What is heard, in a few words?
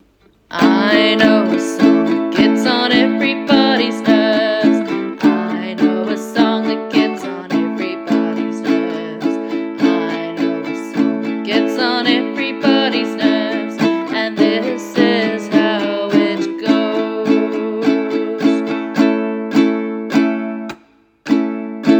Uke; I know a song; Uke book